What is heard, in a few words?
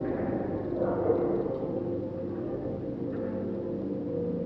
Garage Pulse, Vintage Lo Fi Samples